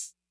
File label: Hats Open Min Decay, 808